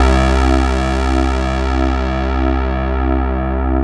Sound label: C Harmony Bass